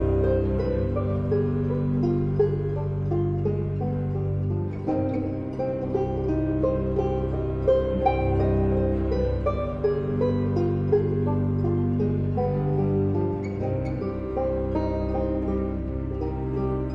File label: banjo, cello, bass; Beethoven, Ludwig Van; Moonlight Sonata- First Movement